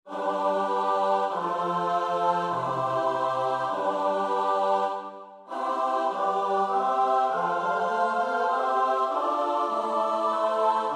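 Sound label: soprano alto tenor bass; Lasso (Orlando di)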